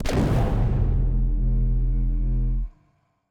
notification death; notifications; Stellaris; paragon

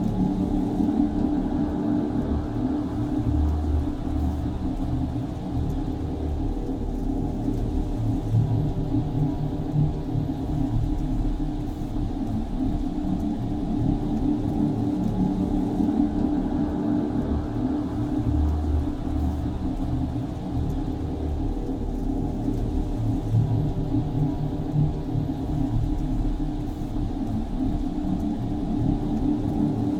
ship battleship idle; Stellaris; machineage

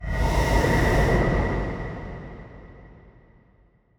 nuked planet; ambient; Stellaris

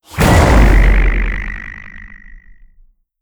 titan laser hit; weapons; Stellaris

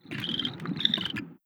arthopoid greetings; Stellaris

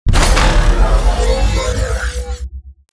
event ringworld build upgrade; Stellaris; utopia

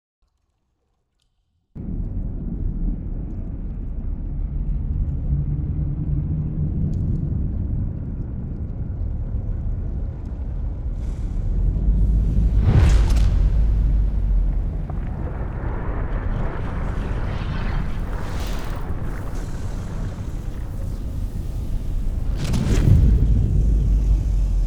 colossus open; aquatics

